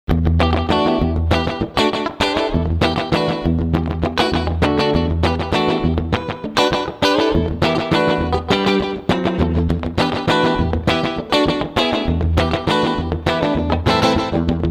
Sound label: Preset Slap Comp